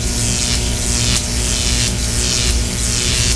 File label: lightingtrap, monster